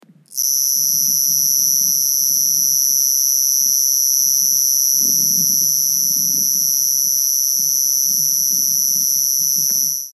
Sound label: Brachytrupes megacephalus; BIOAC ORTH